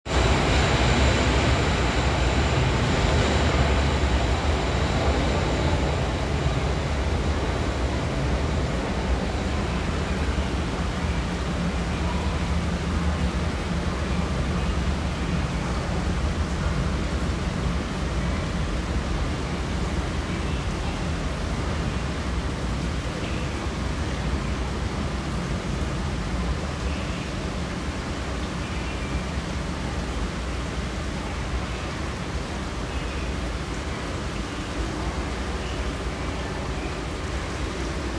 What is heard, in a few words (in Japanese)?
大江戸線都庁前西接近